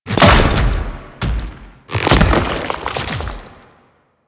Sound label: Biped Entry, Enemies; Warframe; Man In The Wall